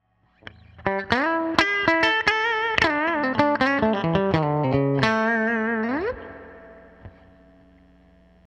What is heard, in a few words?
hum blade bridge